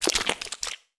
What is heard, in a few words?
Appear Organic; Tsugi; DSP Motion Examples